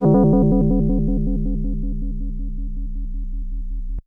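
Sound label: FM Bell FX DX100 C#6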